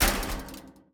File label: Footstep Metal-Fence